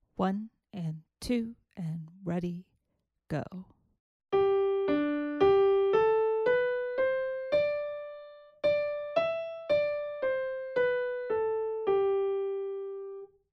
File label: melodic dictation